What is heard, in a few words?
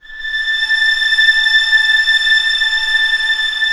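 strings A5 PP L; Sound On Sound - Peter Siedleczek's Orchestra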